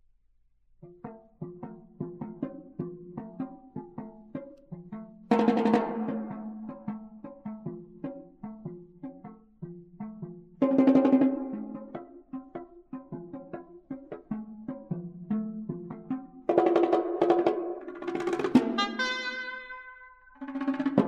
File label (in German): Oboe und Schlagzeug; Drei Stuecke fuer Oboe und Schlagzeug, Nr. 3 (Anfang)